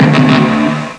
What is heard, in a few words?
Bassoon Bone Beep